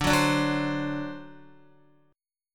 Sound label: D 7th, Guitar; x,5,6,7,6,8; 4F,4A,5C,5G,5C,6E; strummed; JGuitar